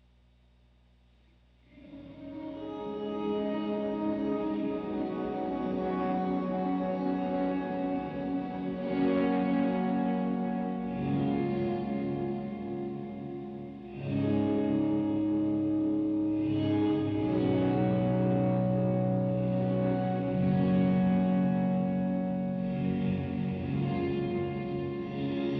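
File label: square drone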